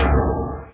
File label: Fracture Perc; ModeAudio Found Percussion Hits